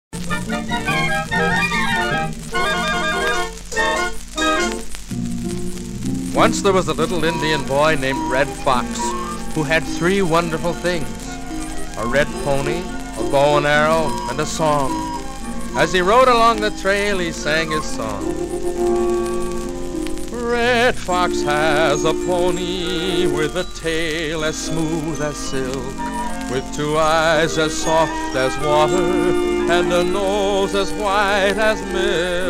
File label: indian drum